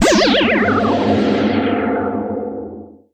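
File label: Danger Sci Fi Button Press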